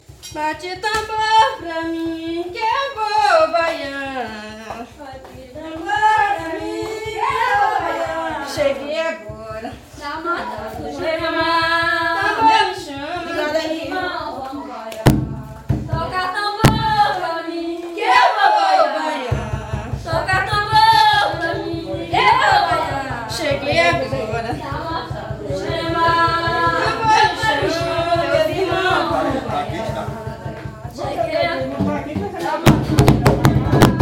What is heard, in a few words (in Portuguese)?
tenda sao jose bate tambor